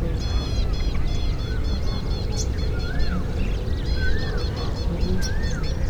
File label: Alarm call of the Ashy Prinia; Shivam Chitnis and AK